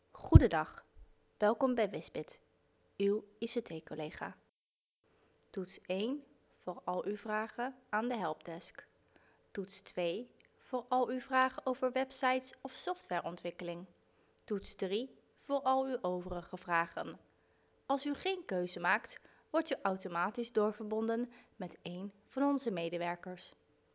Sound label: oud; wizzbit